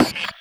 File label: radar drone marker, user interface; gmod; mw